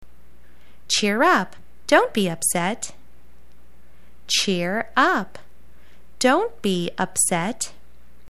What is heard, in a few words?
cheer